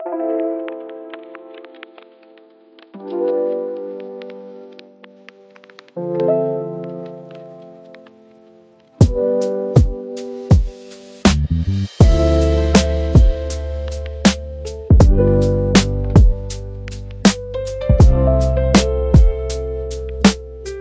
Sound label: Travel Lofi